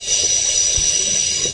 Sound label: subway sound; Subway Sound Construction Kit